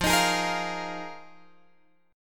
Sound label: F Minor 11th Guitar Open F; strummed